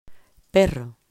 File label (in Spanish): perro